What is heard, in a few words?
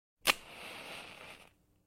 match lighting on